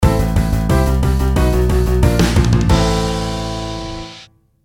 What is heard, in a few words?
eq test